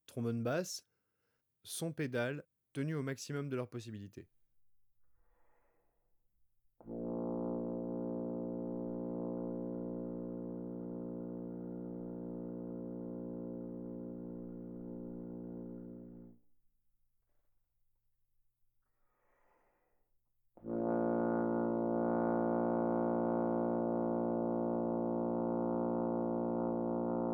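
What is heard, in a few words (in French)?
basse sons pédales, trombone; Textures; Sons granules sonores; traite orchestration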